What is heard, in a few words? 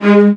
VIOLAS A#3, Synclavier Strings; ILIO